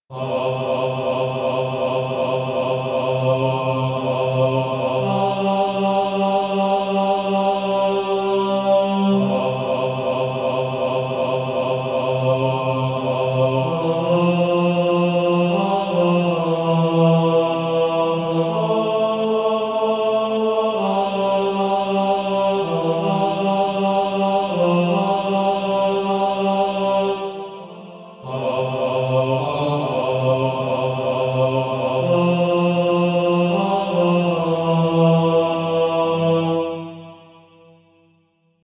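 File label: Male Choir, bass; Redeemed How I Love To Proclaim It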